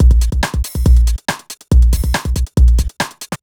fast break 140

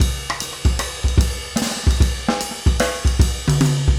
5/4 jazz 150

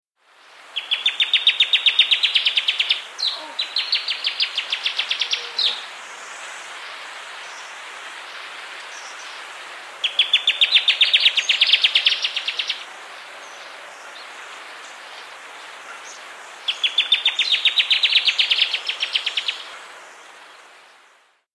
Pacific Antwren; S. America

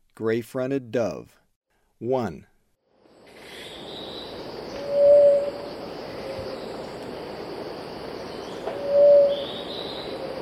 Gray-fronted Dove; S. America; Eastern Lowlands

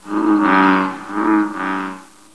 BULLFROG

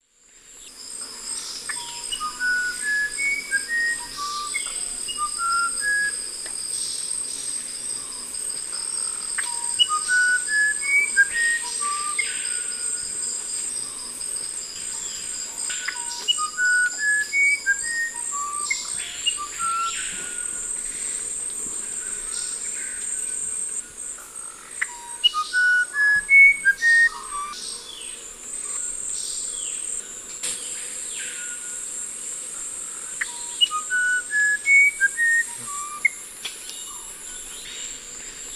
Cyphorhinus arada, song; S. America; Eastern Lowlands

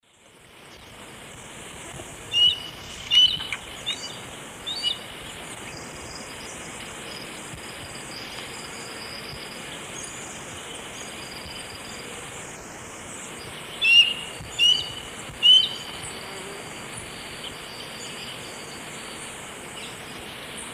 Buteo albonotatus, flight calls; S. America; Eastern Lowlands

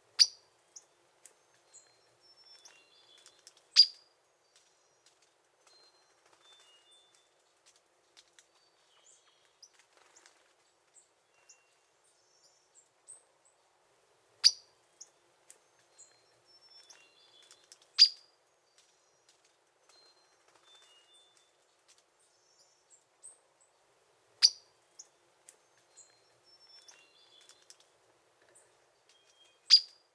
Call, Birds of the Costa Rican Cloud Forest

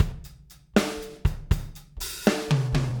Drums, Rock

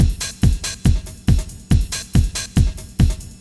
techno 140

